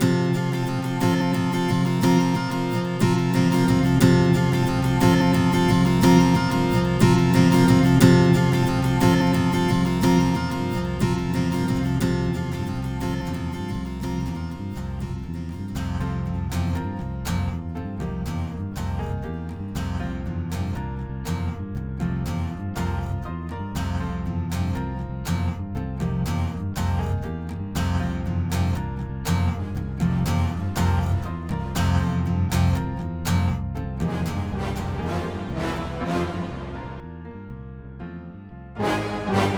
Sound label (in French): Rythme Medley